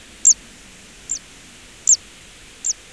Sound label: Cisticola juncidis